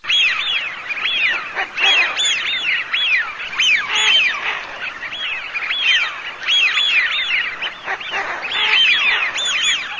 fischione, birdsound

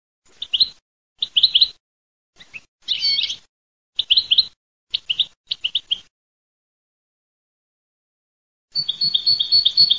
CARDEL GABBIA

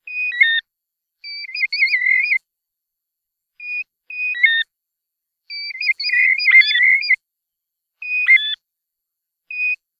piviere, birdsound

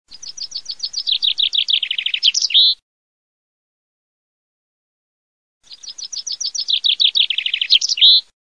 FRINGUELLO PINSON, birdsound

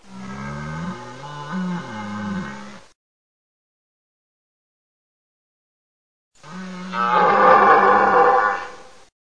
cervo

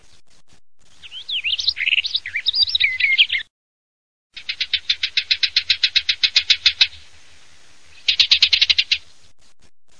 BIGIA PADOVANA GROSSO

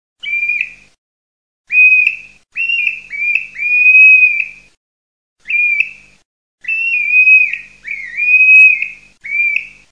PIVIERE MALTA

